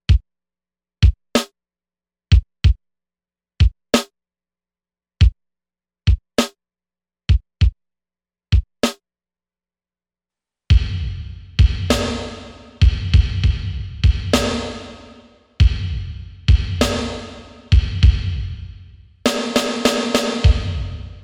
MEDIUM ROOM I

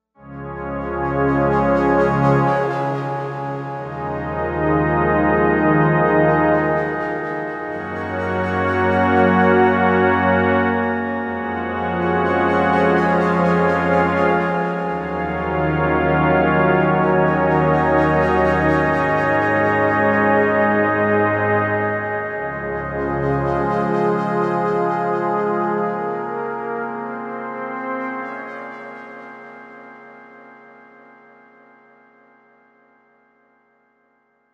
Stretched Pad, Novation UltraNova; Factory Patches

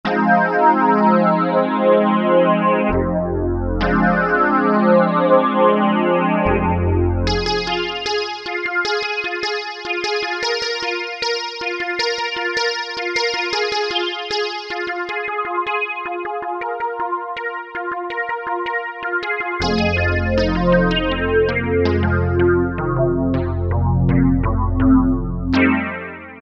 JX-10 reso, Roland W-30; Factory Performance Patches; Factory B

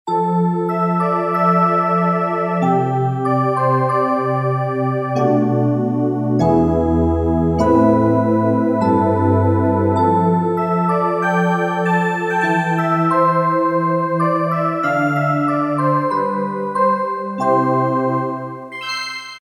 New Age, Casio CTK-601; Tones

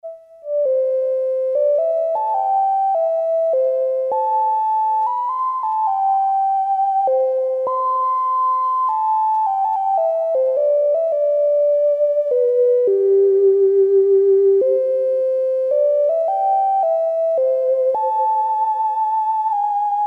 Flute, Farfisa Syntorchestra

